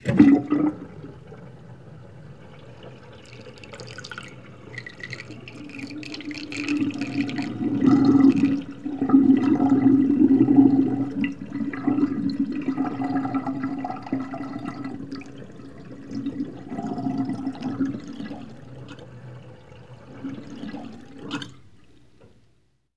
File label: Bath Water Drain